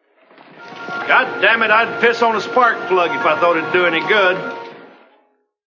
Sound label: spark plug